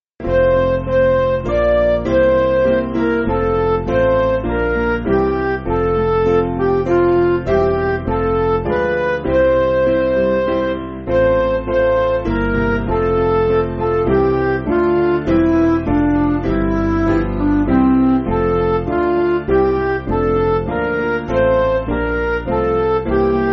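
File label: Midi, Music Backing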